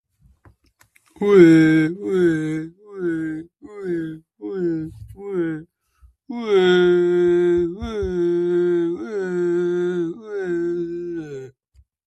chanting

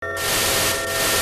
giygas attack

